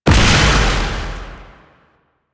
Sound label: Explosion Small; Weapons; Warframe; Duviri; Incarnon Adapters